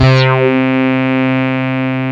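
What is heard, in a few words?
synth Morpheus; Sound & Vision - Gigapack II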